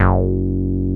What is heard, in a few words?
MC 202, BASSES